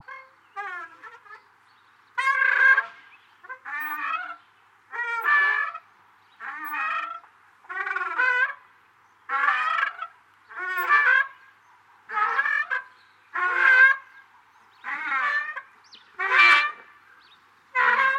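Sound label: creak of swings in park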